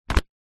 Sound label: office plastic document folder wallet set down surface